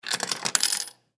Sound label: coin insert